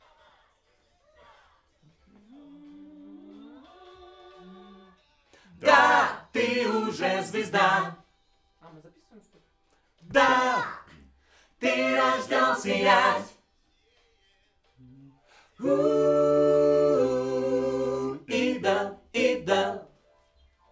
Backs AKG

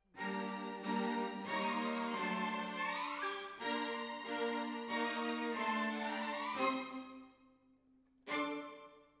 Decca, Jazz for piano

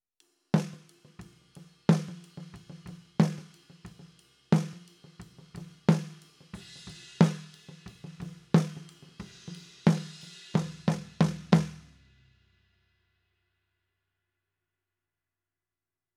SNR

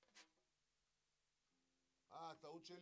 SNR

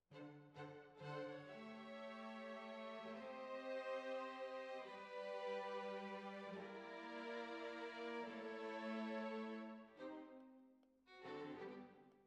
Flute

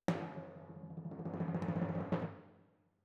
Timp Close

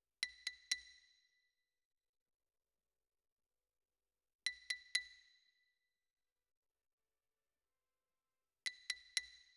Xylo Close

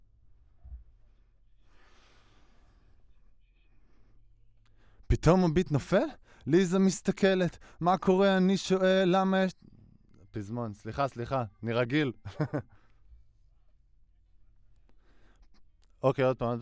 Voc

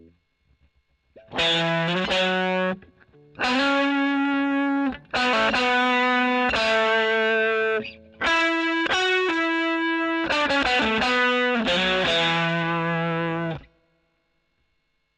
E.GTR OD; Gefell

